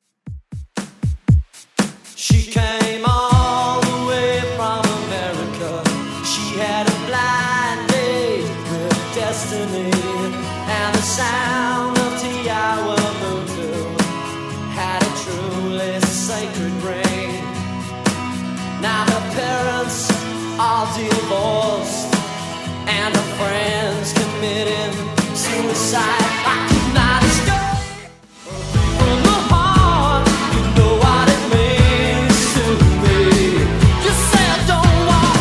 80s Pop Rock Redrum 118bpm

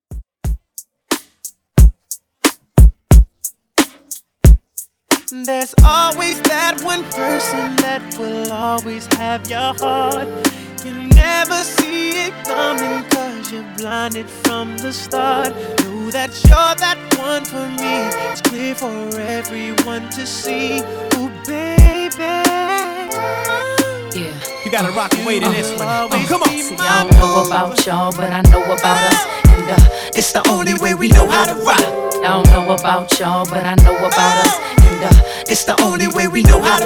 Redrum

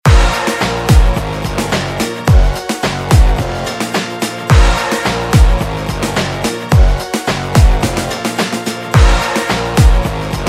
Throwback Refix

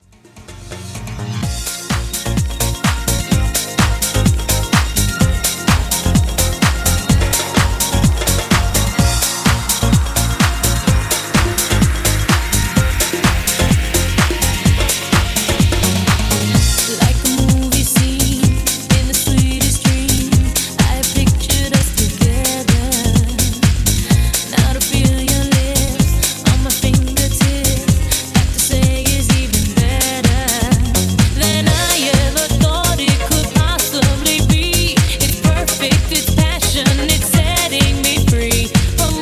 quick segue; 127Bpm

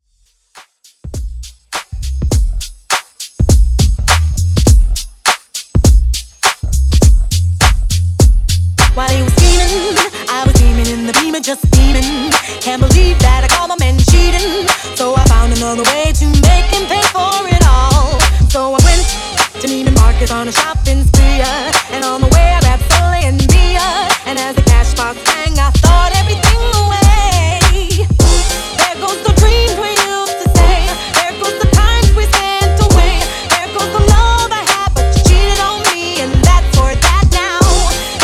92Bpm

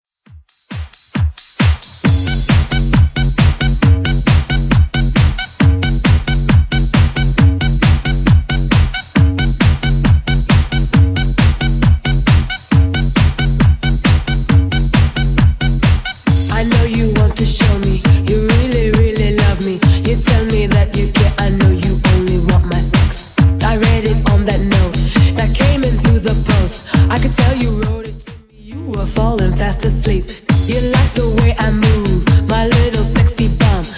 90s 135bpm; Xtend Edit

